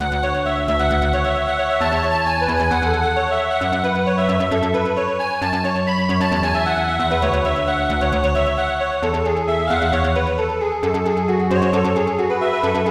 A calm tone of noise

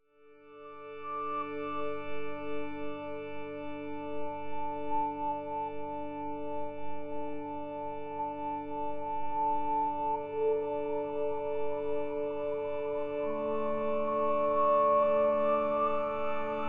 synth

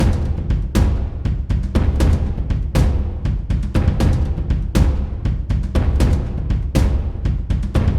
Cinematic Drums 120BPM

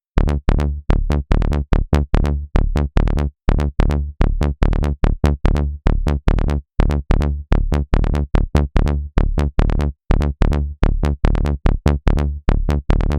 Bass Fullon, PsyTrance; LiLA'c Records PsyTrance Sounds 01

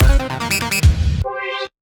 FILL, PsyTrance

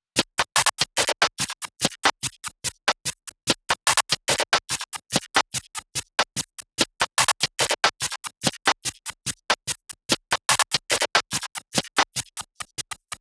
Loop, PsyTrance

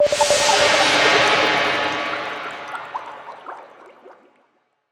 FX; LiLA'c Records PsyTrance Sounds 01

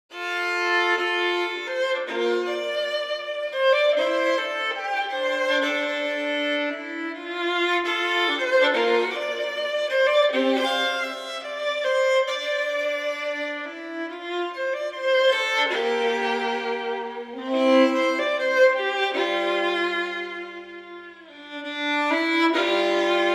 ViolinSolo

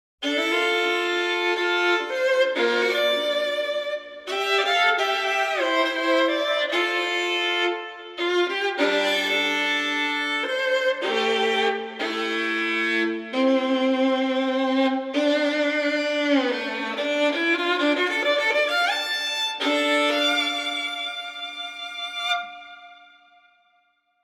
Violin Solo

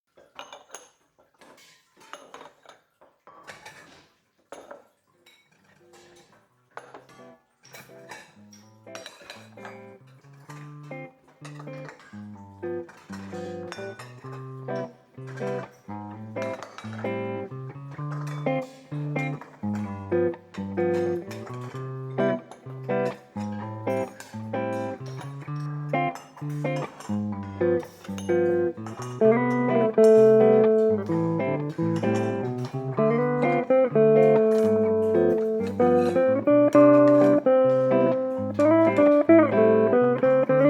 Instrumental

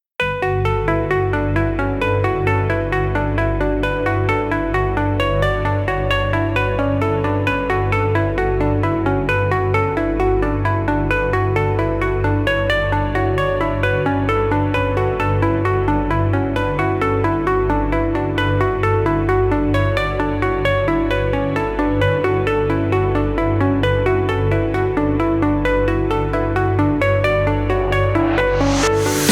Instrumental

